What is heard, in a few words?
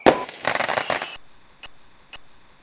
firework fire; fireworkwee